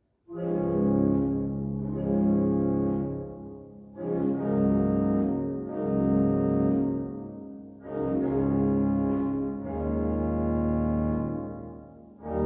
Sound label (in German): Gamba 8 Salicional 4 und Salicional 2 4, orgelrundgang